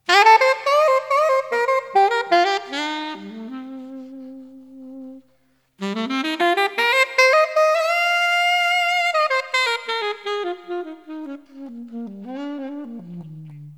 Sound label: ALTO SAX